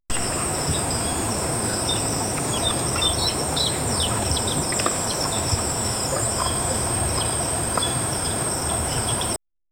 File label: day sound in village with